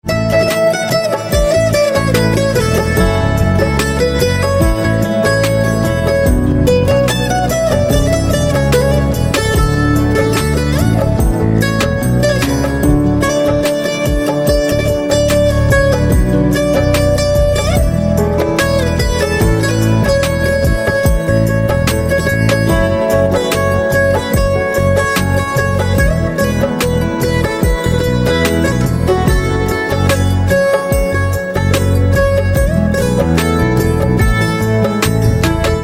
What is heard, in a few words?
Mandolin